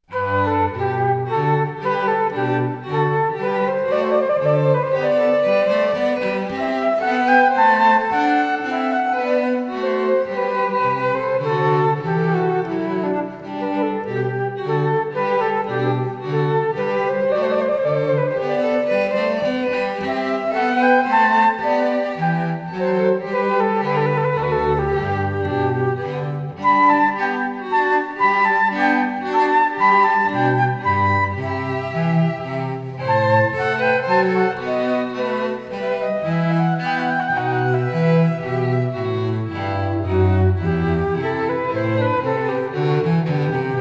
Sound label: menuet; alcina